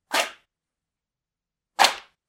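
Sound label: shuffling sound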